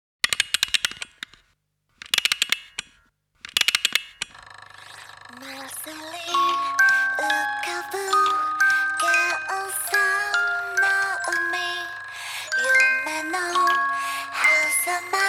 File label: Lullaby French Wind-up Doll; Mirage Lullaby